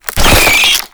Infested Survival Purification Weakpoint Appear; Warframe; Nineteeen Ninety Nine

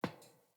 Boot Walk Metal, Footsteps; Characters; Enemy; Boots; Sinecure; Melee Grunt

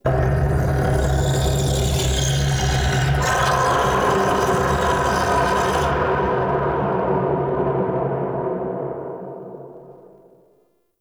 Misc Chasm Scream B; Ambience; Warframe; Man In The Wall; Entrati

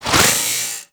Worker Grinder Whoosh; Warframe; Grineer

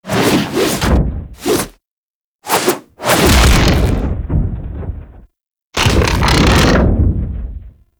Mount Front; Warframe; Teralyst; Ropalolyst

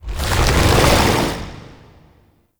Stomp Cast; Warframe; Infested Lich; Hard Drive

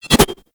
Tonfa Parry Whoosh; Warframe; Tenno

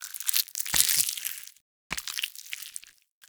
Volatile Runner Taunt Flesh; Warframe; Proto Infested; Abilities

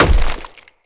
Blunt Heavy Impact Flesh; Weapon Impacts; Combat

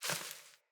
Sparrow One Wing Flaps; Animals

